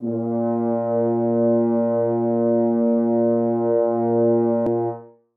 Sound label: A#2 mp, brass horn section